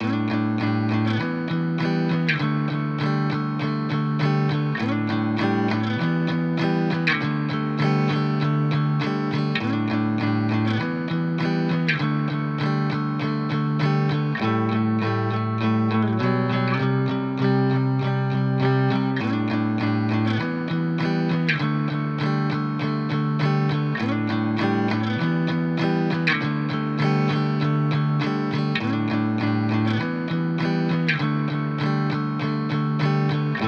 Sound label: 100 BPM E Min Main Guitar; Cymatics; Miami Guitar Pack - Pt 2; Winter